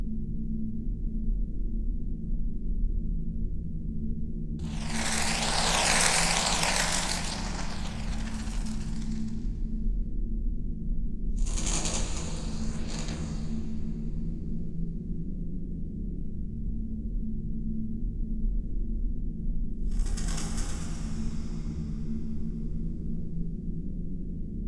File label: Cave 3D loop; Town of Night